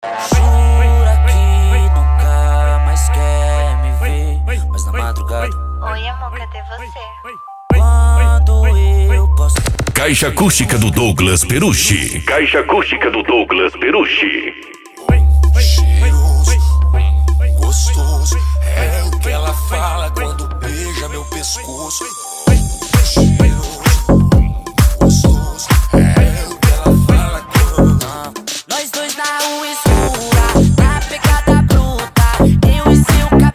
sertanejo remix

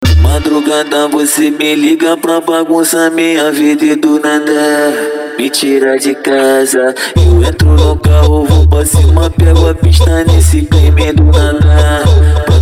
racha eletronica e funk mega bass

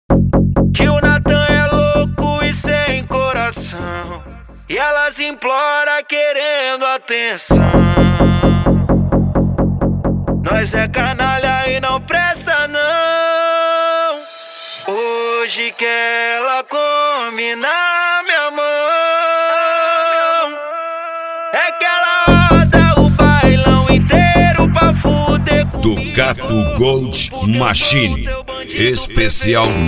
mega funk

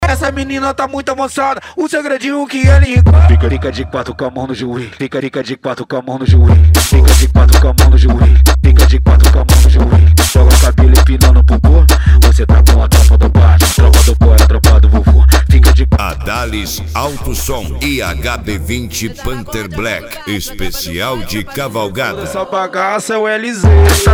esp de cavalgada

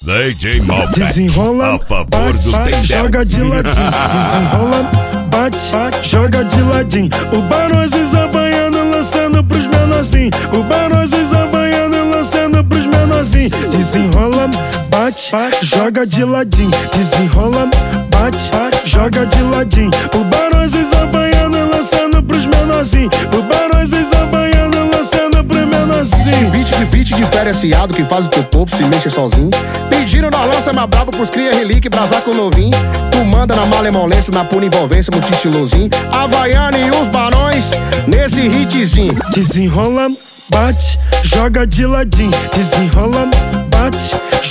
sertanejo; carneiro auto som e carneiro chopp

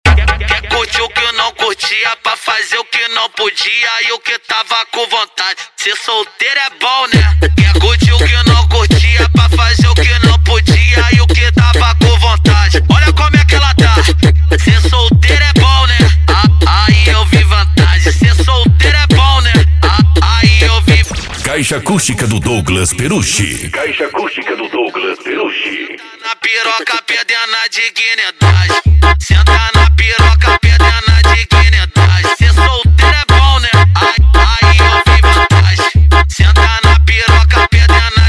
especial funk mega bass

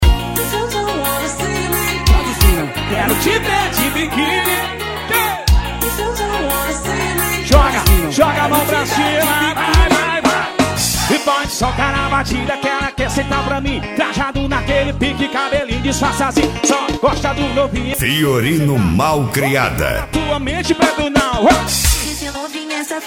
piseiro forro

